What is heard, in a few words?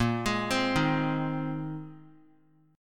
A sharp 9th Suspended 4th A sharp; arpeggiated; 22,27,27,25; chord; JGuitar